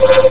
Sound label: Element Beam; Elements firing beams